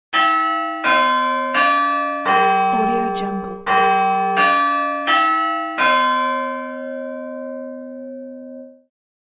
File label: big ben doorbell